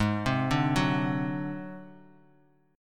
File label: Ab 7th Flat 5th Ab down Bass Standard; Ab7b5 arpeggiated; JGuitar